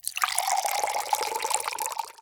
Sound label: pour